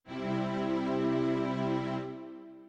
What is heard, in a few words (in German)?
Klingel Akkord Streicher